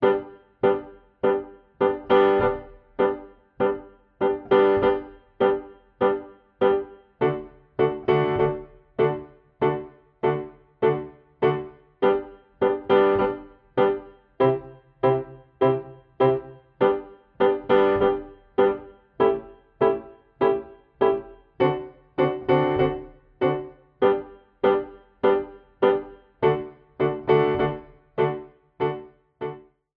tango

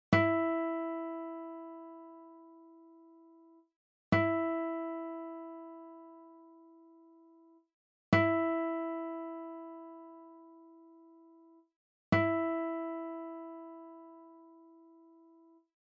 stimmton e

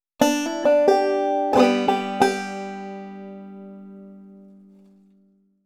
triole durch pull off

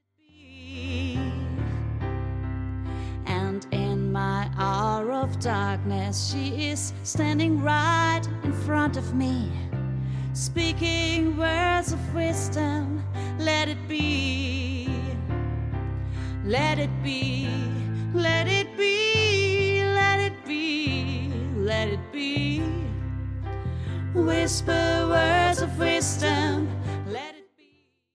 ohne ukulele

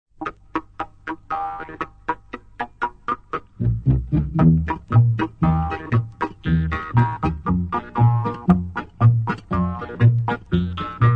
maultrommelmusi mit gitarre und bass

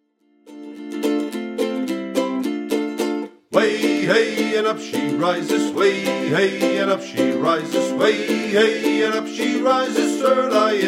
what shall we do with the drunken sailor ukulele gesang

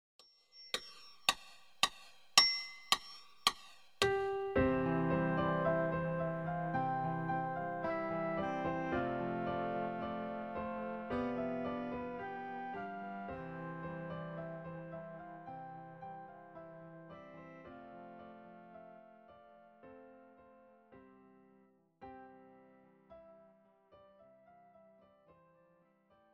vogelgesang

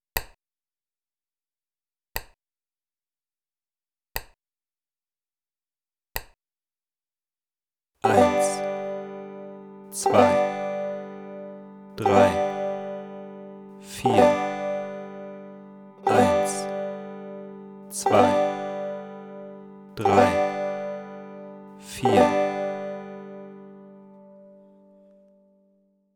anschlaege im viervierteltakt

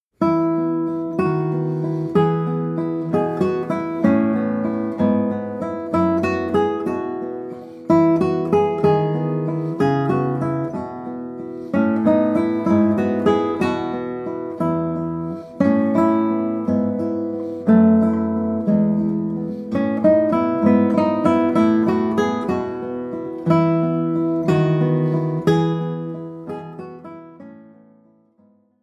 walzer

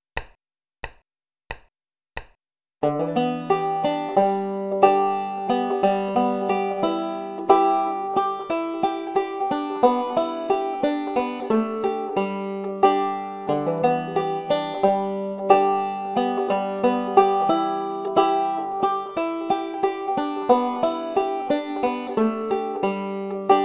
cumberland gap mit hammer on pull off und slide

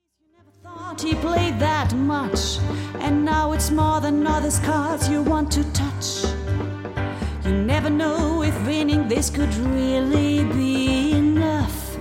ohne ukulele

